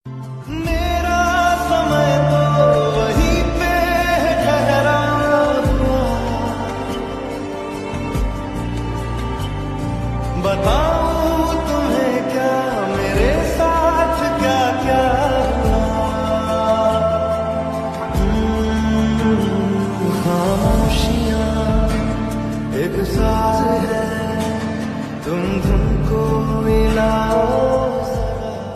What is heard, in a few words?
Ringtone